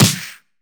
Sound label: Snares; Vengeance Samples